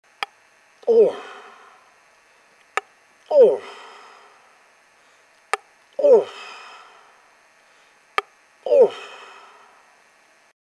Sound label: Old Bull Wants To Fight, Moose